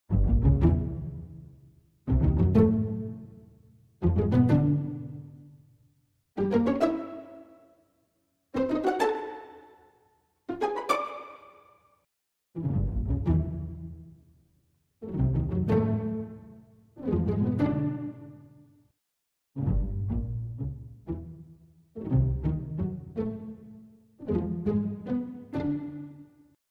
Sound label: sneaky walk